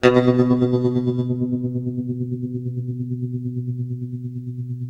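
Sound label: tremolo B 1, guitar; East West; Steve Stevens Guitar Samples Collection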